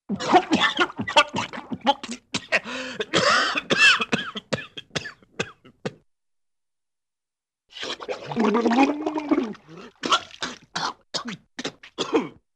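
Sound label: Man Coughing with water Glubs; Hollywood Edge; Cartoon Trax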